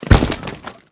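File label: ram hit siege; Onager, Ballista, Ram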